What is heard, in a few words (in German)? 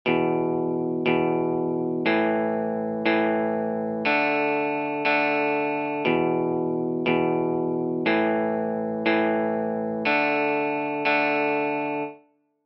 Power chord Uebung